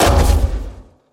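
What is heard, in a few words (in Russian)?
короткий чёткий глухой звук выстрела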